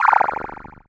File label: Cry